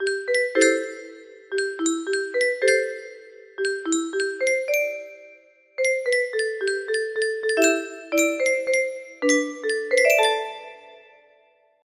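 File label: music box melody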